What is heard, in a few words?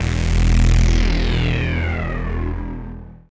Acid Bass Stab